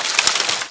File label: tree rattle; tiny